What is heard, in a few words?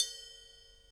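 ride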